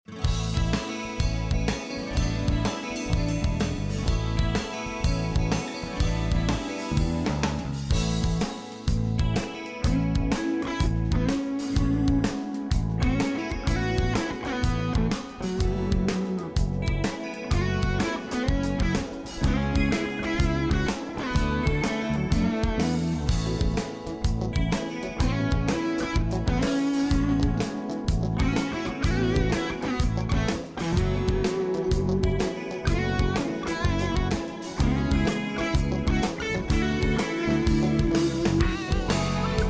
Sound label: bgm